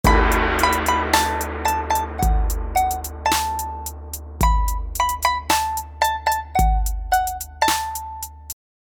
Chinese Trap Instrumental

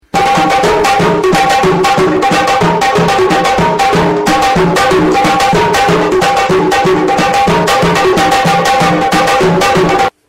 Abu Fanus Drums

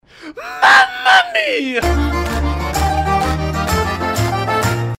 Mamma Mia Italian Tune

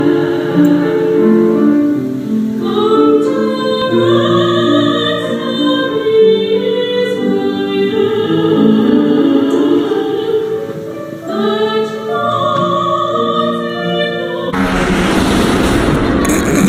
Loud Fart At Church

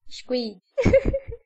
Hehehe; Squid

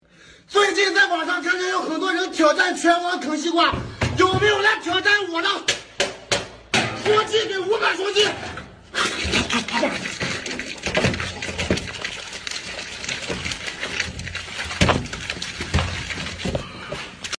Guy Eating Watermelon; Asian; Meme

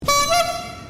Clown Honk; GTA

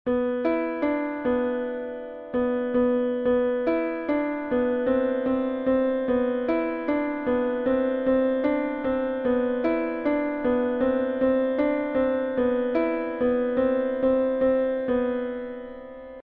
Rap Song Beat

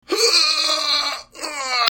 Groaning Male

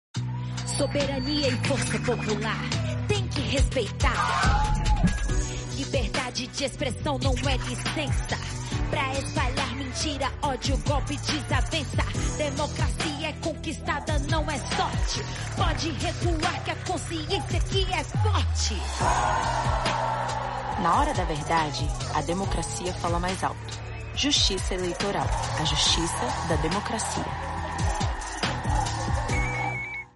Rap; Democracia

